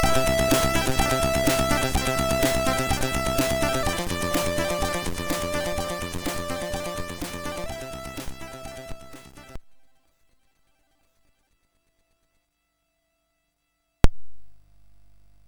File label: Hires Demo Kit, MOS6581R3